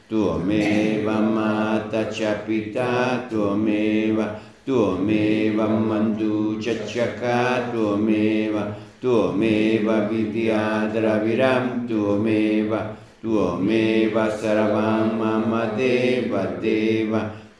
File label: Tuomeva Mantra; mantren